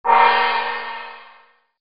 gong

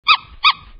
squeak double

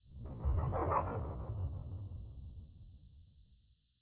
scary fade out

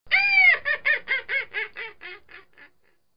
witch laugh

